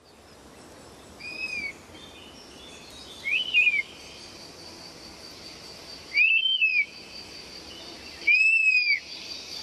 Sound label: european honey buzzard call